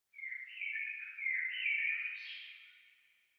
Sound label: ambient europe birds